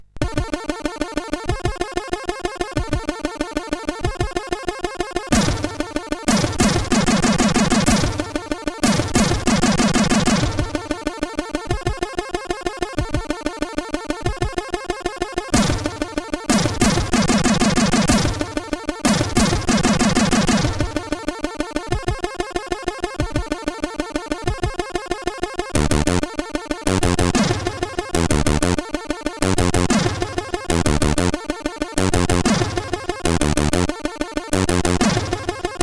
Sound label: Intro, CSG8580R5 SID; Creo